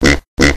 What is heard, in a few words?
pig; stamps